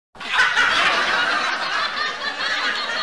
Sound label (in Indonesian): ketawa; bajuri